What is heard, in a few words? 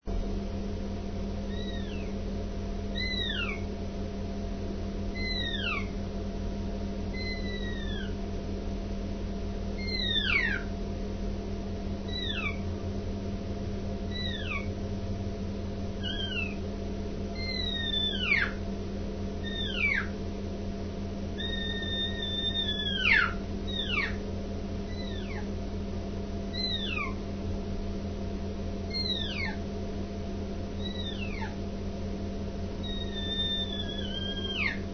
cow, elk; elk contest; elk 2001